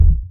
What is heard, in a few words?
bloop